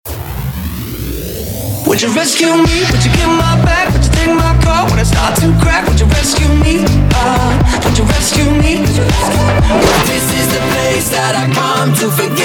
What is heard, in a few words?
pop rock mix; cars